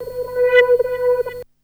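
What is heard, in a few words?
SYNTH, TIMBA, Hip-Hop & R&B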